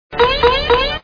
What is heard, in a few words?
Boing